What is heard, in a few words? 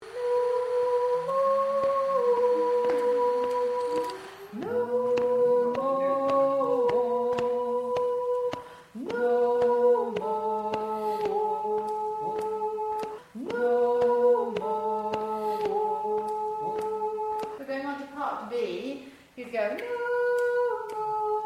Tenor